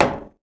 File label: button